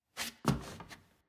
Footstep Wood Rotate

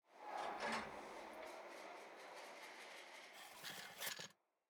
amb building engineering office; demacia rising

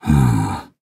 Nonverbal Happy; Ornn

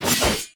Attack Light; bloom minigame

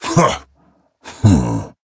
Nonverbal Scoff; Ornn

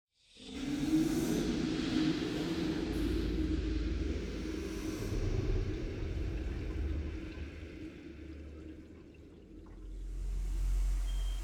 Hollow Space

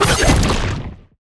attack jump heavy; bloom minigame

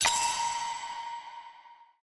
UI Click Arcade; bloom minigame

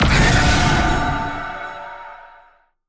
Dark Binding Projectile Slow; bloom minigame

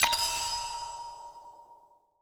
UI Click Story; bloom minigame

